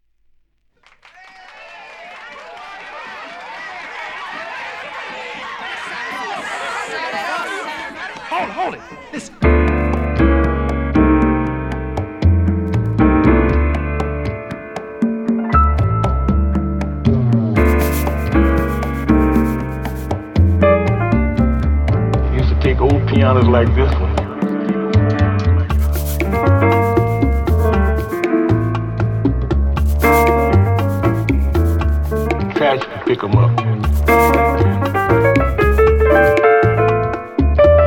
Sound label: Broken Piano